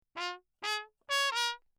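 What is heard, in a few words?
trumpet mute Bach phrase; CrownAlu; Perinétventiltrompete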